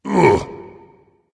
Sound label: ugh dragon; fafnir